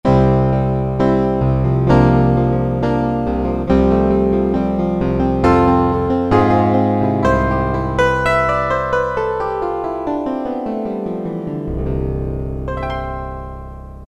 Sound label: Synthetic Piano, Roland PN-D50-02